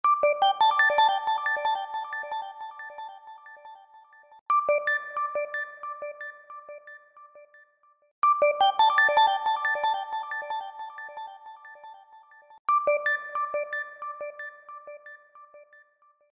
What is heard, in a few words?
rotation, ringtones, OnePlus 6 Ringtones